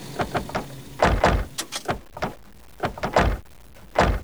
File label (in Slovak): autodvere; pezot